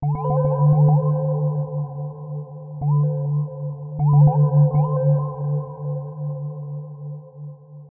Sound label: Atmos FX, Atmosphere & FX; Touch Loops; BlissedOutBeats